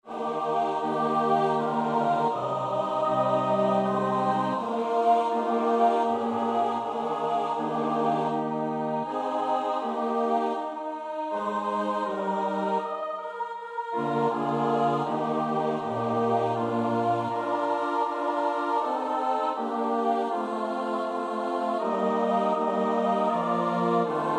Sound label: S A T B; Attwood (Thomas); Teach me, O Lord